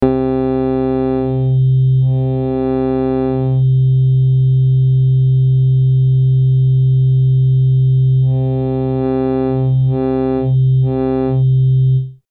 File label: Korg NTS 1 Wave Plug In